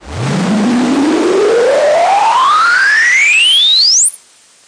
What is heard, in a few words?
noise Modular, Noise Sweeps